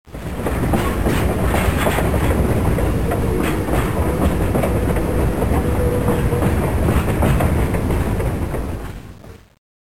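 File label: train roll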